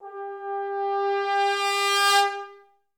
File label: TB CRSC G3, Advanced Orchestra; Best Service